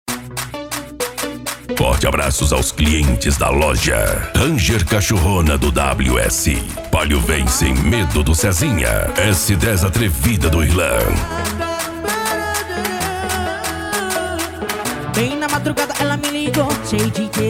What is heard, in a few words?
funk mega bass; atrevidos sound car